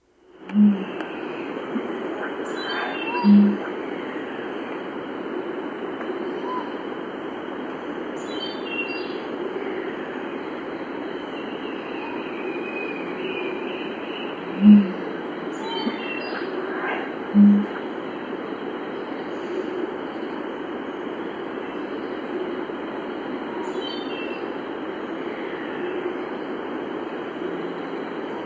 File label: Bare-Necked Umbrellabird, S. America